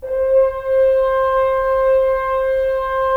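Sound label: Solo French Horn, Brass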